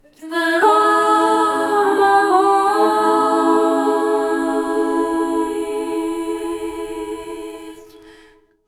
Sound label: nepali folk vocals sung